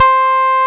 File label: Roxichord, E.Piano; Roland LCDP10 Keys of the 60s and 70s 2